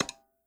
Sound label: GUI Mouse Over Tower Icon